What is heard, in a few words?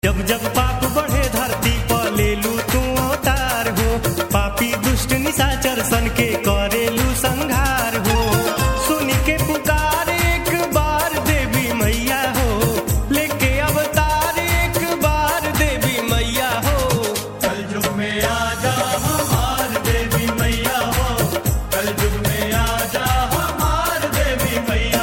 BHOJPURI RINGTONE